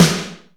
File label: Reverb Kick Snare; PS-37 Dance Synths; Percussion